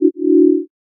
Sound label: tada; noteye; roguelike